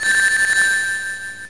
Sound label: sonic lose rings; Scratch